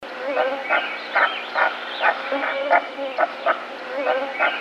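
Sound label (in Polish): nurogęś, głosy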